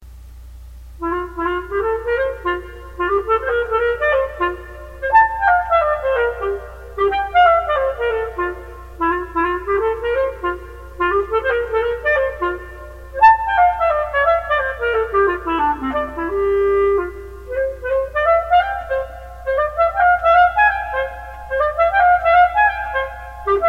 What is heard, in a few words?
Allegro Moderato for Solo Clarinet; Romeo et Juliette Op24 No22; V Bellini arr Klose